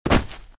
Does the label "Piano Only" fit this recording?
No